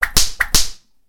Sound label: whipping; jidaigeki